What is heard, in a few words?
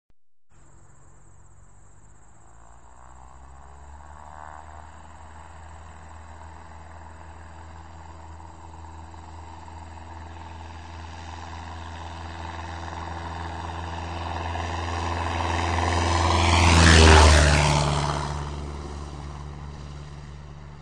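propeller airplane flyby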